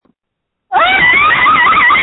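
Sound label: Scream, phone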